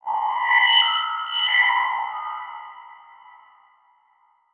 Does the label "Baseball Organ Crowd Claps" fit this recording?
No